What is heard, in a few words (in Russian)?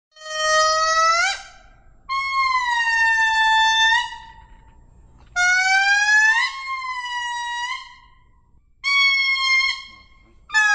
indri